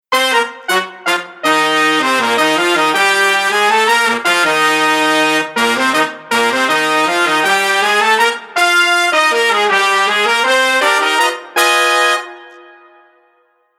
Horn

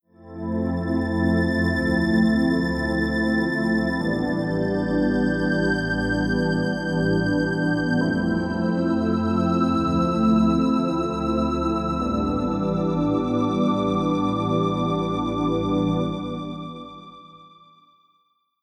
Synth Pad Melo

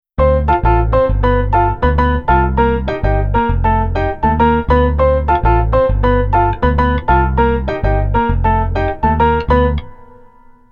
keyboard rhythm

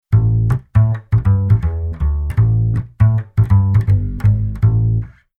Bass Arr Jazz Bass

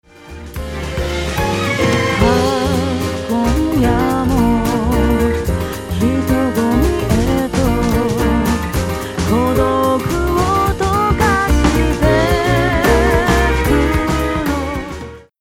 Distortion Over Drive Before

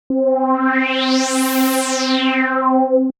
Synth Subtractive Sweep

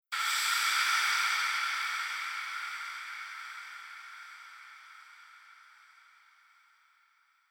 Reverb Delay Hit Reverb On; Mix Insert

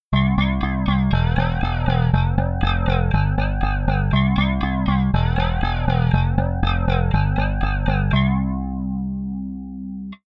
Bass Effector Flanger

